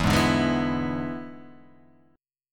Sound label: E 7th E down